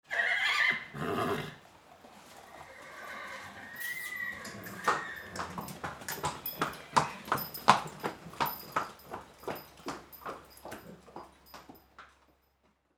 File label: horse walking on concrete